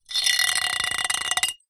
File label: impacts glass piece broken light bulb fluorescent scrape concrete ground